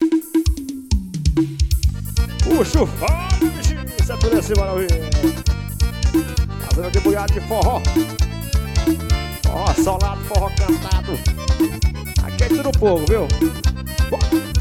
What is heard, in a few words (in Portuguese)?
teclados solo